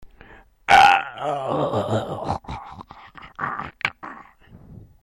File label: death